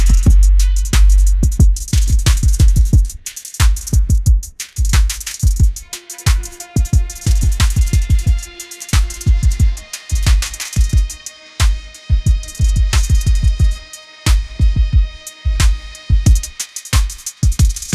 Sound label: dark orchestral trap anthem, ringtones